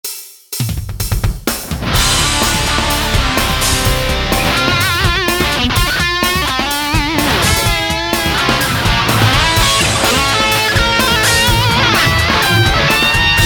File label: freak guitar; amtelectronicsusa; freakguitar demo